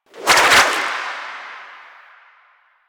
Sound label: Kumihimo String Whip; Warframe; Koumei